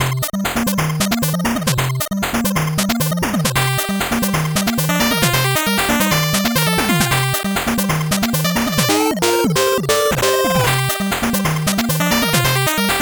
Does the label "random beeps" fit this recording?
No